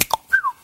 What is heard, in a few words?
toot